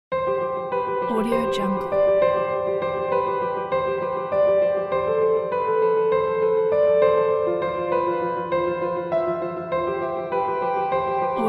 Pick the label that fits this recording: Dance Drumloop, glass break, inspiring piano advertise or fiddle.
inspiring piano advertise